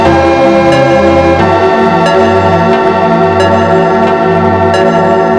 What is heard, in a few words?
loop